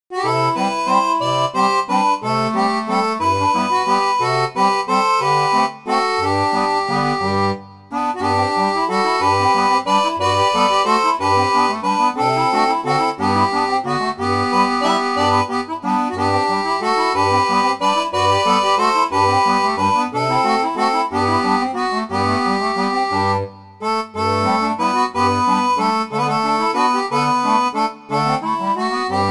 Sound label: seraphone